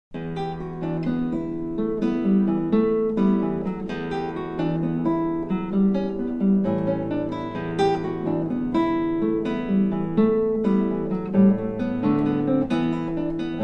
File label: steady roll